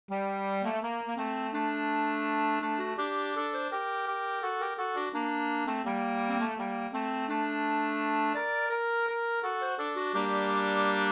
ganglat; Min levnadsafton e Hjort-Anders

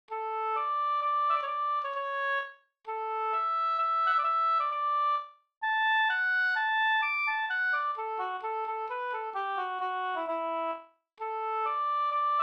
menuett; fr Overlulea LouisM55p18

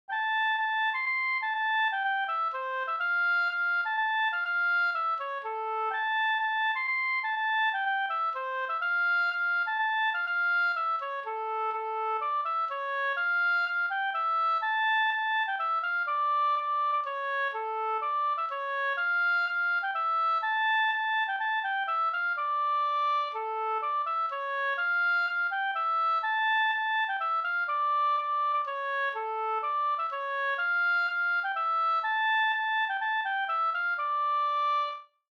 polska Bodapolska

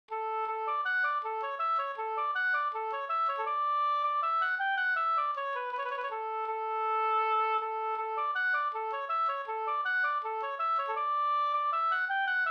skanklat

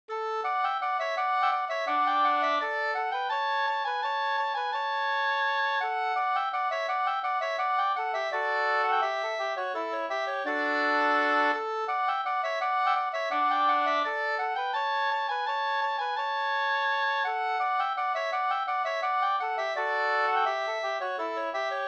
skanklat; Skoldinge skanklat

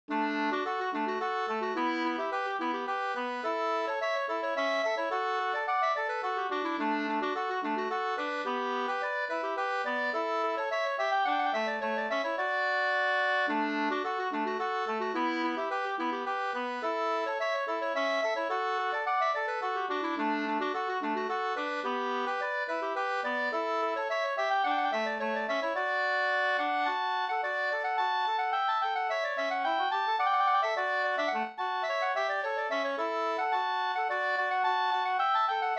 polska Bingsjopolska Polska

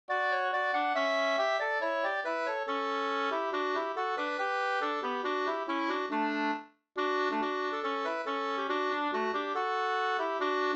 folkdans, brudedansen; Dybeck

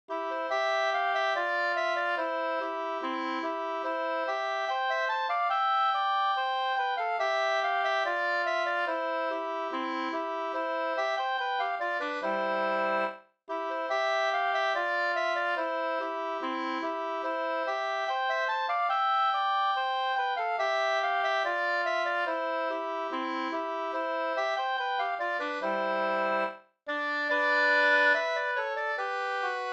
brudmarsch; av C Lindkvist

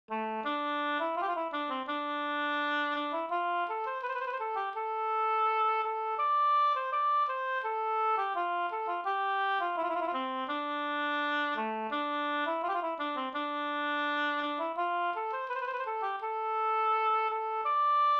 skanklat

